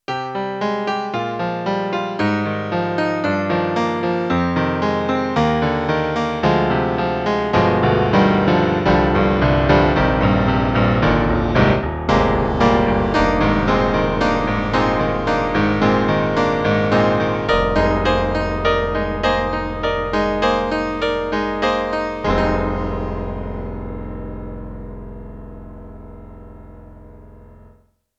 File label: E-MU Proteus1, Low Grand; PopRock